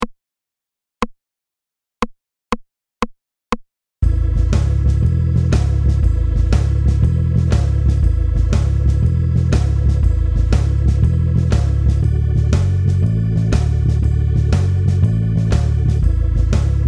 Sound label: backing, 120